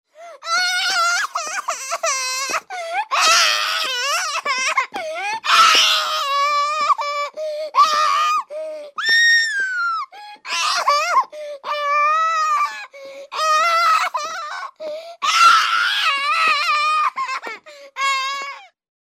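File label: hysterics, angry crying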